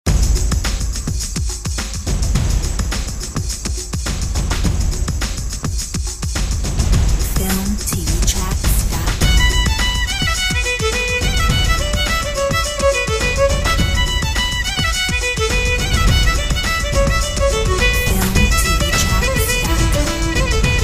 Celtic drums

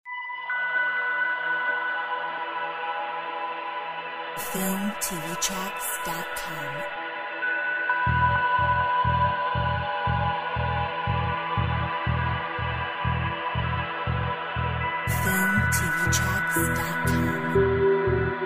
Ambient Light

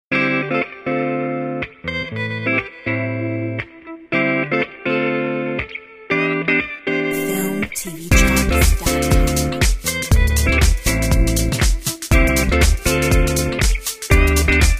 Soul style

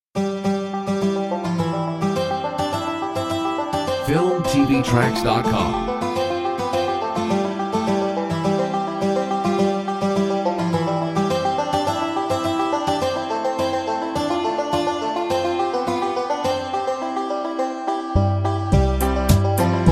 The Battle hymn Banjo; Filmtv-tracks

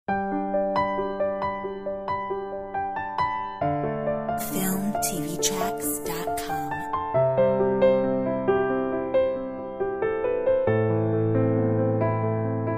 Evocative Cello; Filmtv-tracks

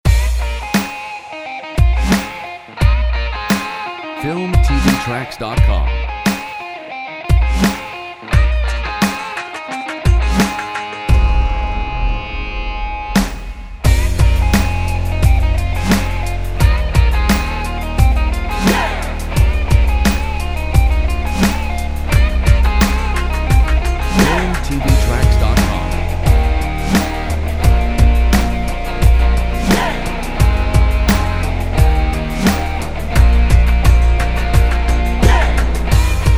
Energetic Powerful Stomping Rock

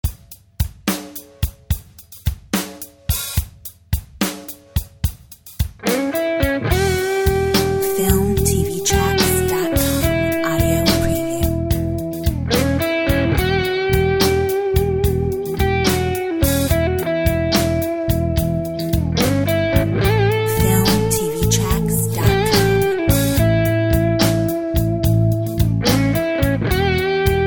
Blues

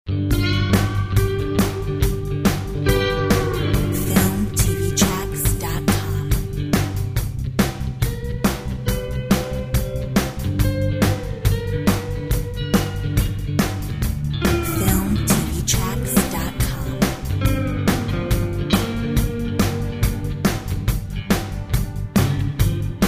baba blues

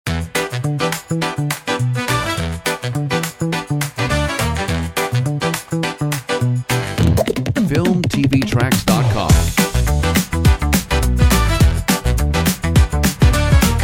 BOSSA HIP HOP; comedy funny

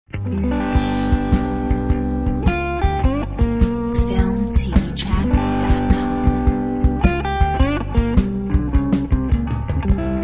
Chicago blues

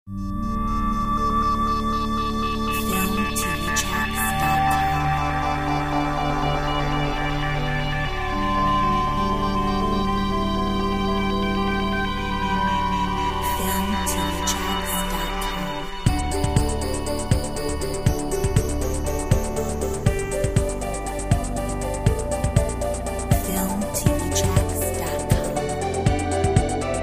Modern City

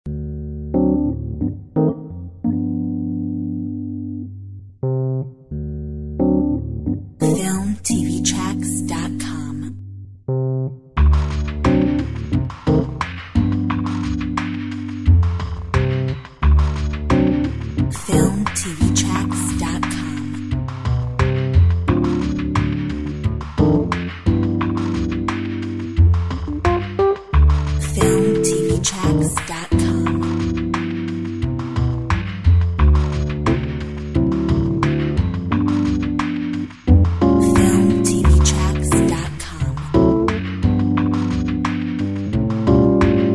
Bluesy jazz

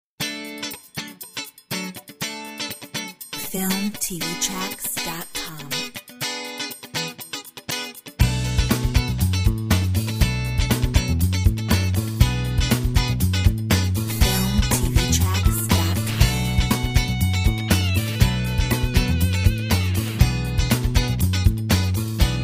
Funkydelic; Filmtv-tracks

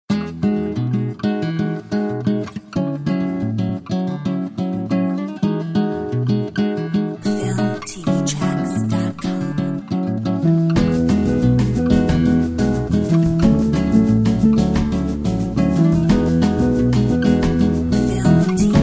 Bossa

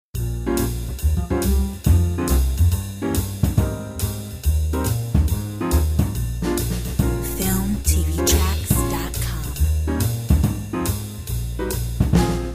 Chicago swing

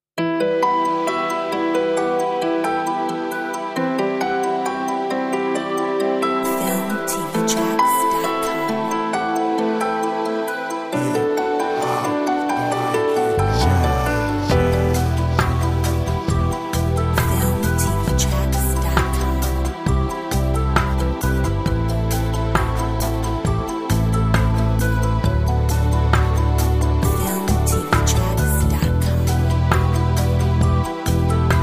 dream trap

